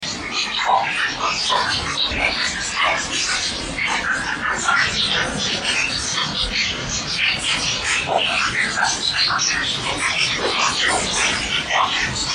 Phone Noise

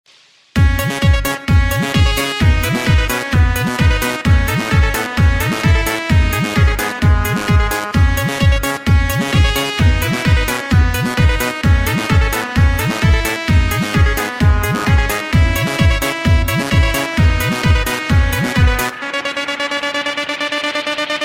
Bass Mix; Airtel

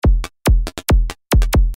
Attack Loop

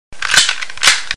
Gun Reload

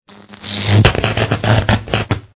Electrical Current Strong

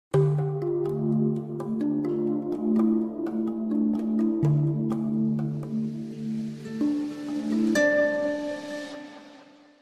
Iphone 6

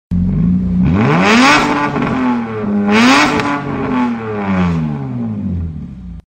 Bugatti Sports Car Put Put

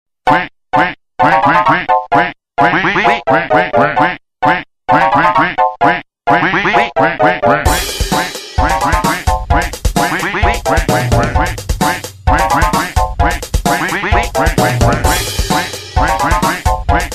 Macintosh Computer

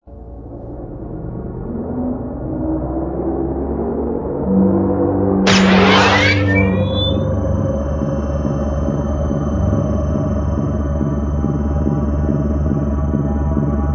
Engine Start Idle Stop

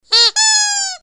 Toy Voice

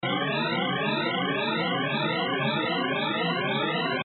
Rapid Alert

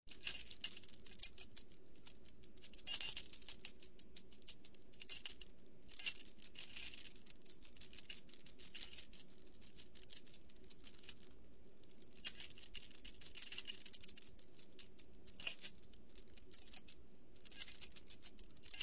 Keys Jingle Long

